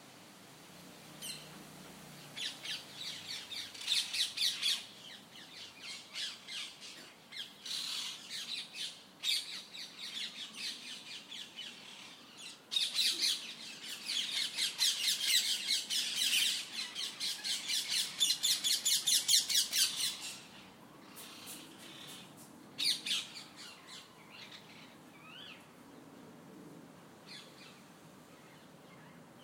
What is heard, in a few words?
ring necked parakeets